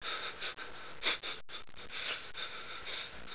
BREATH

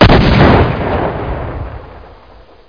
explod; Abuse デモ版

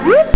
BLUUP; Internet Pratique 01

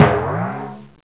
bounce; McDonaldland - Silly Games; McDonald's

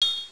dink

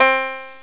banjo

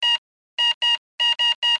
beep; industrial

